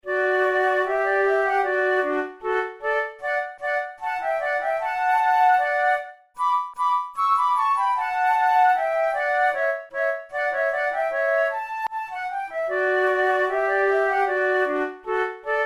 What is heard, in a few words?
duet, flute; haydn; military movement